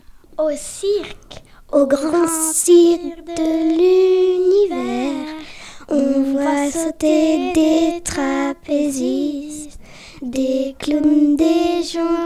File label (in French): chanter